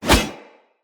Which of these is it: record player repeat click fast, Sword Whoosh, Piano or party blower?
Sword Whoosh